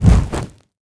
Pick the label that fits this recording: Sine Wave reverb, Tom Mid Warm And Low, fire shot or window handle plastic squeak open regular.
fire shot